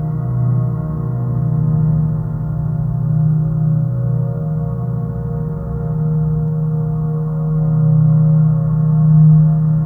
ambience

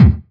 909 BD amp; Goldbaby Tape808 & Tape909; The Tape 909

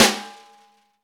SNR, Snare; Club-50 - Foundations Roland; Ext.Snare 3

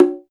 Perc, Alesis DM5

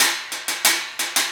Cymbal Pattern; Sonic Foundry (Sony Creative Software) - Essential Percussion

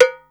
handbell L, Yamaha Motif ES drum machine; 300 Drum Machines; Yamaha Motif ES-Series

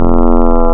Harmonic Xfade, wavetables; Animoog

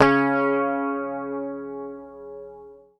C#3, Bass Library, PICK; Sampleheads; Will Lee Bass Library

